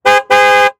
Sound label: Auto Police Car Honk Horn Multiple; Transportation